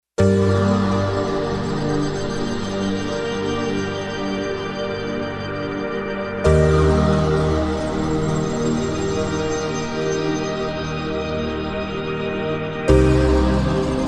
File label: Mystery Pad, synth